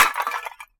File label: pot smash; Scrat